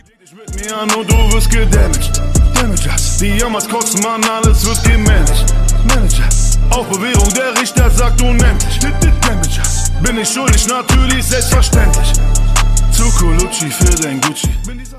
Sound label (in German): deutsche rington